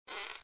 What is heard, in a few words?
bow coil; skillfite